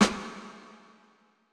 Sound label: snare, lofi hiphop; Legendary lofi hiphop